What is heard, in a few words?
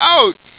ouch; Deliantra